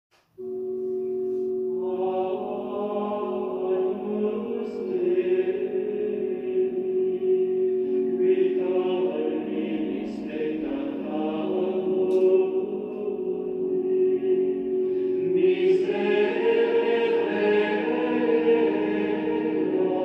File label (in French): Messe des Anges Agnus